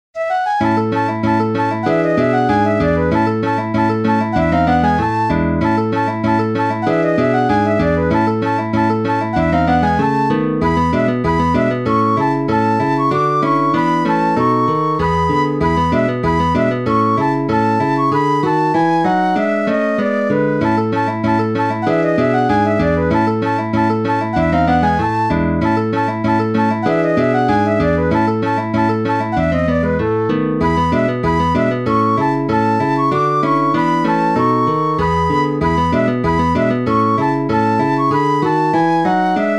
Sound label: Flute Guitar Duet in A Major